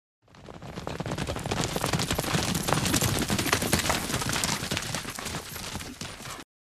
Horses Stop Six Horse

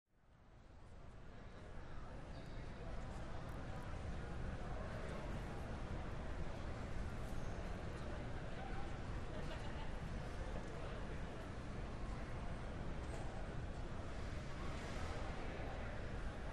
Airport Interior

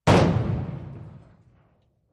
30 Feet Primacord

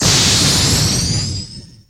Underwater Laser FX

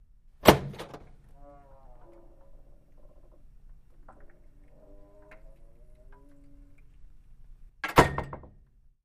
Door Push Rail Wood

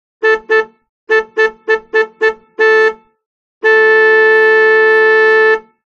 Horn Ford Granada Car

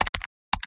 calculator keystrokes; calculators

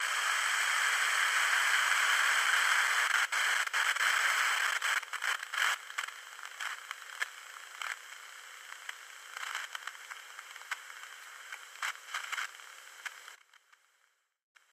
Radio Static Broken, static

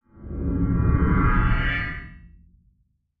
Space Ship Cloak Off; spaceship fx

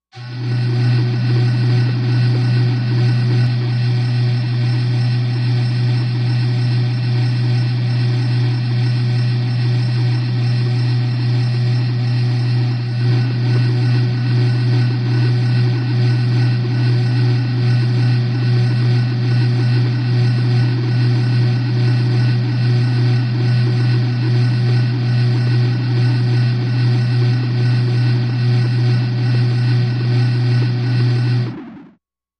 Soft Metal Analyzer, machines

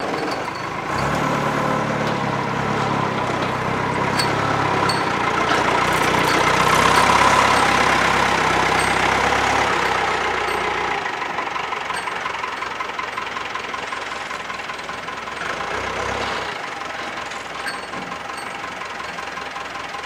Forklift Out Slow And Idle

